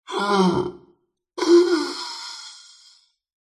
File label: horror zombie monster or creature soft strained breathy grunt; zapsplat